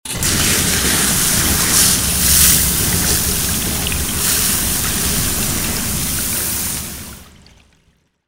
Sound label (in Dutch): Brandweer Brandweerspuit